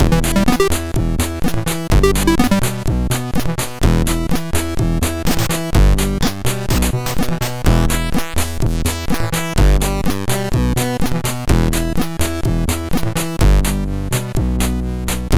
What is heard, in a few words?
Ringaround, MOS6581R2; sid